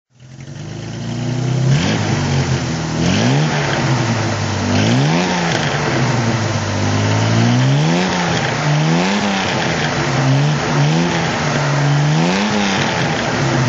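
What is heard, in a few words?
jaguar xk150 fhc throttle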